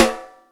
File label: Les Rim, Yamaha DTXpress Acoustic Snare